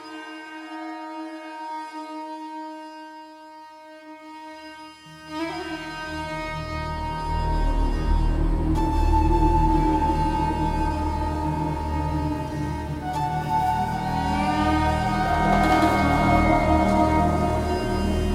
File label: Ambient Loop; The Desert; prince of persia 2008 gamerip